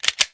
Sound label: blaster ready; GoldSrc